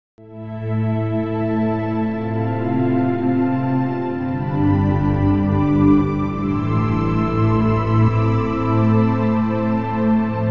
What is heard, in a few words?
strings at 116